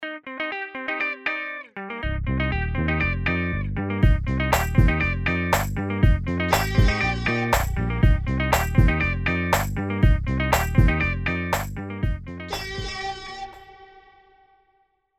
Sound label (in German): ausgangs jingle